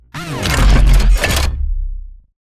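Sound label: weapon stow; bt; Titanfall 2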